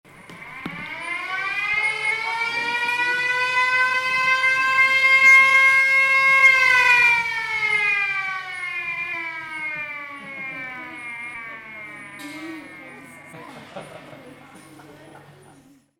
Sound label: Basel Tinguely Museum Siren; engines, installation